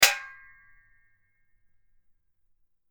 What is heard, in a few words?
Metal clank